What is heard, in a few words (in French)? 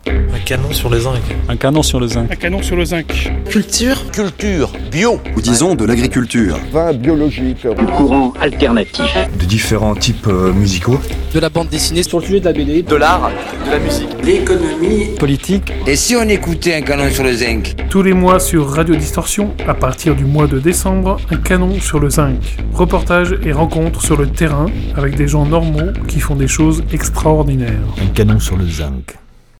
Jingle